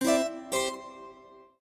Harpsichord, notifications; Samsung Galaxy S7

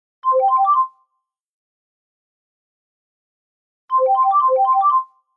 Incoming, Nokia 6260 slide Ringtones

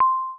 Volume min; Audio themes

